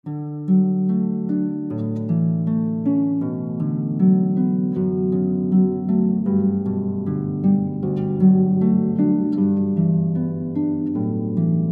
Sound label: solo harp; canon d